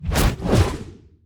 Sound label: portal entity enter